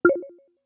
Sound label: clearly, notification sounds